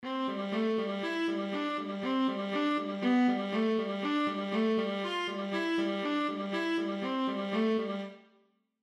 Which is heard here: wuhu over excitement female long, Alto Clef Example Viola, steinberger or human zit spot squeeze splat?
Alto Clef Example Viola